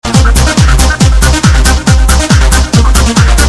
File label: Loop